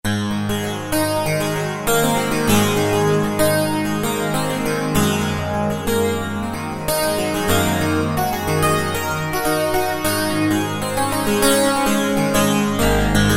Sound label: Wires, Yamaha TG77; Factory Presets